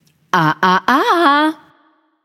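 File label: disagree, female; smite; voicepack; hera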